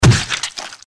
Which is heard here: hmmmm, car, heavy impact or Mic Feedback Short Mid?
heavy impact